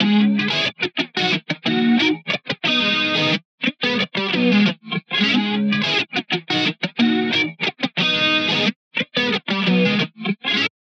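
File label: guitar rhythm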